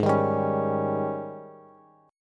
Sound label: Ab Major 7th Suspended 4th Ab down Bass; strummed; JGuitar